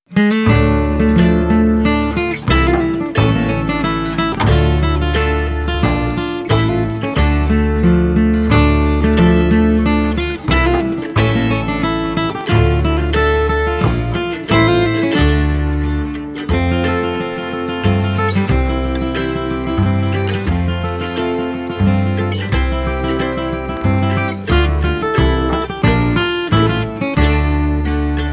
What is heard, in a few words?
Bridal Polska